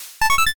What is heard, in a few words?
line clear